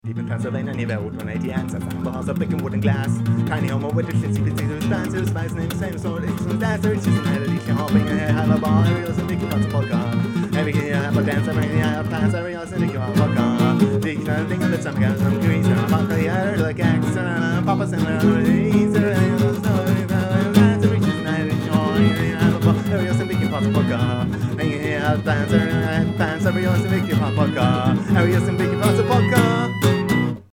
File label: fart polka